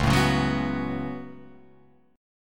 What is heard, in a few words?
Db 7th Suspended 4th Sharp 5th Db Guitar Open F; JGuitar